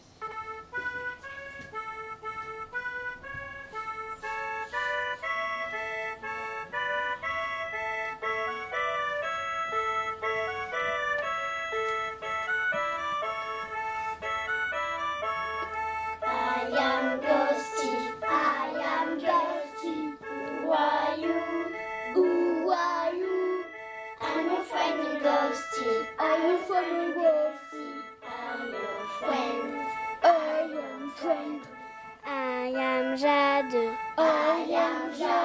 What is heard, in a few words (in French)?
chant